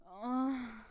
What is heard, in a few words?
pleasure moderate; VIVAE